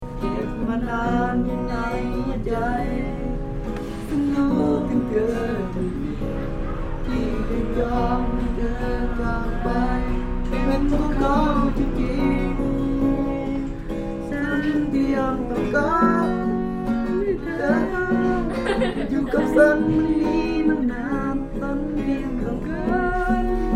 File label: silvershop serenade, laos; these kids hanging out in the jewelry store / in tiny Muong Houn were playing Thai pop songs